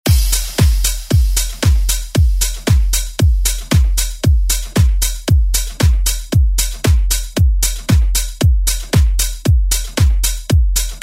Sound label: 94 ReDrum